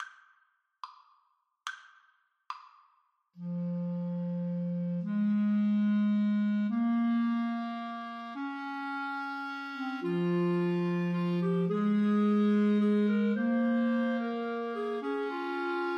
Clarinet trio

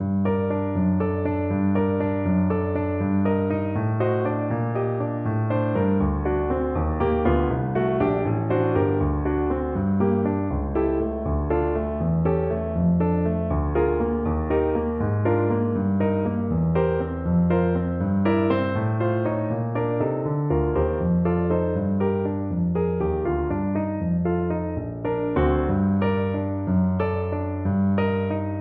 scarlatti cessate, Tuba

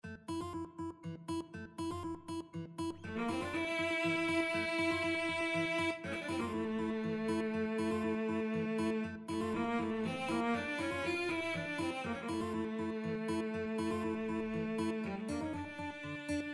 cello guitar; Bolero

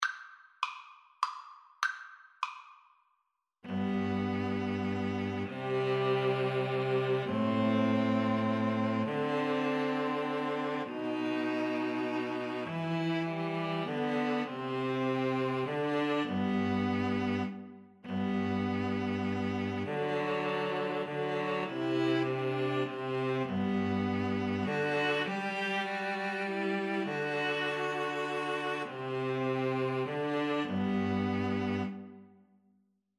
string trio

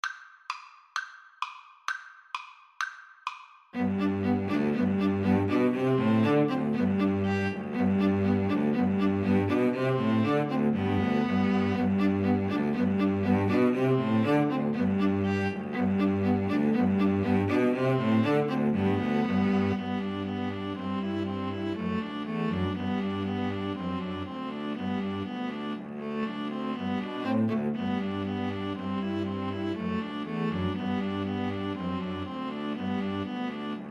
ecossaise, String trio

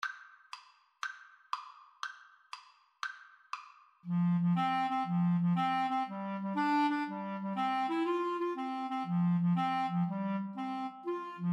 irish jig medley, Clarinet Duet

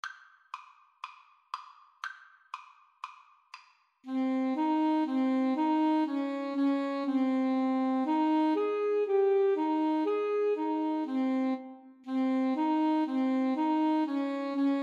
old macdonald 2 A SAX

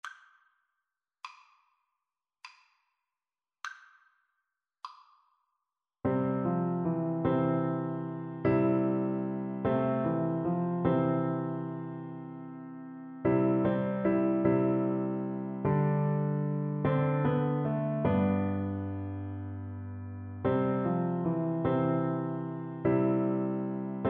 blessed assurance, Bassoon

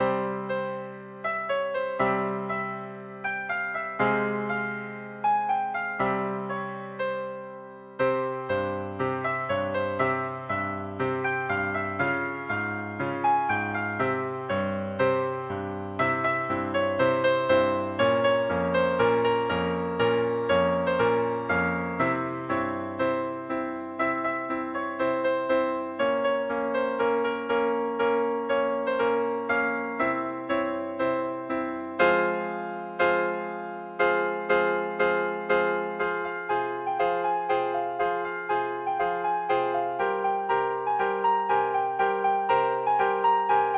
hava nagila, flute

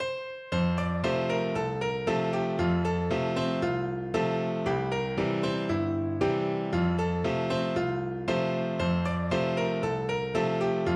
mango walk, piano

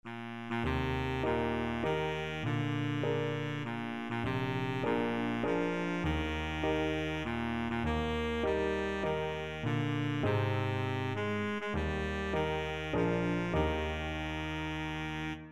happy birthday, baritone saxophone

